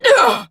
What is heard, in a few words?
Pain, Battle; Warframe; Dax; DaxRanged; en